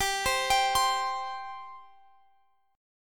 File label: C 5th G